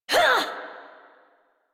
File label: Exert, Adult; New War; Melee Swing; Operator